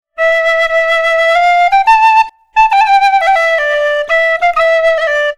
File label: Penny Whistle, Celtic; Sonic Foundry (Sony Creative Software); Continental Drift Aftershocks